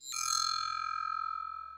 synth FX, Hardcore Breakbeat, 134 Emin; Big Fish Audio; Hardcore Breakbeat Action; Mellow Jack

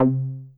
POKE BASS; BASSES

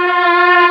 MELLOTRON; East West - Global Sounds

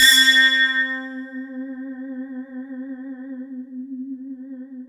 C4, SynthLine; 90 s Sample CDs; NiceBeats; SynthLine VOL-16

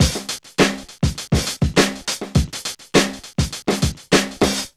KICKIN 102, Funky Elements loops; Zero-G

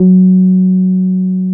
TB-303

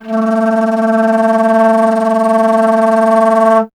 Alto Flute; Miroslav Vitous - Solo Instruments VOL-2

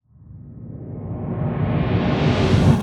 Reverse Fx; musicradar

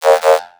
synth hit E; Virtual Riot; heartless

